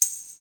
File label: Tambourine One Hand Shot